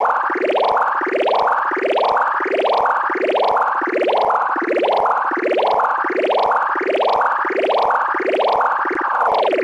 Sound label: Shield